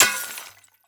Crash Glass And Metal Debris Falling On Concrete Short; crashes; AdobeCC